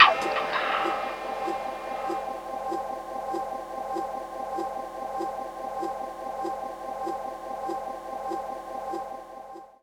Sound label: SFX VOX; Rawcutz; Nu Forms